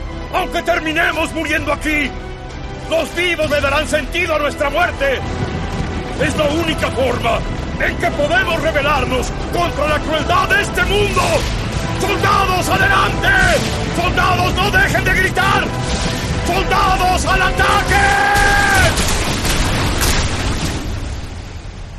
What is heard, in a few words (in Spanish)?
grito; erwin; snk